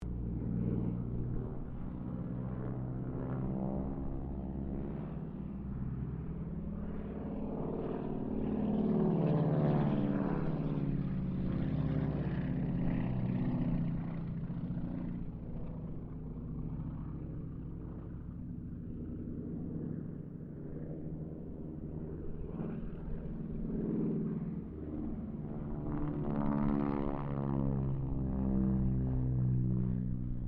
AIRCRAFT HARVARD SQUADRON 3 PASS DISTANT

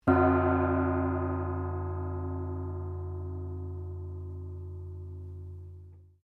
GONG CHINA MEDIUM RING SOFT, Chinese gongs; Cymbals; Musical

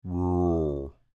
Creature Speak Groan Short, Human; Groaning; Big Room Sound

